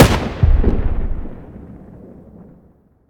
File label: grenade explosion distant